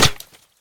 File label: stone, hit; tacz-tweak-pack; taczmechanics